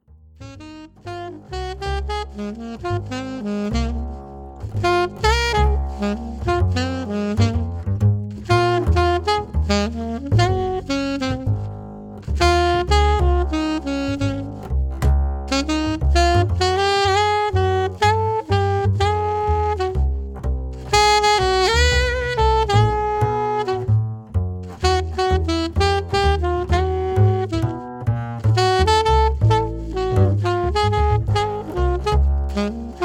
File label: sax bass stomping